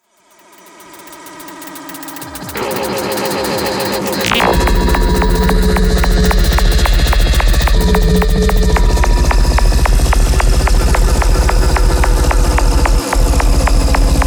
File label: cauldron; wizards